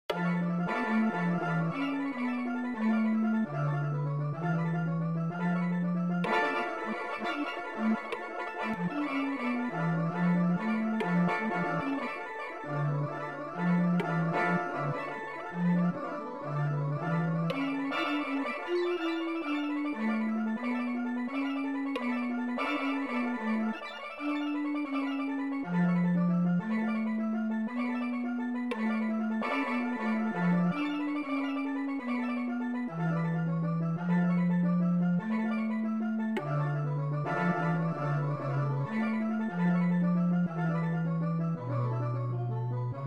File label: hawaian scale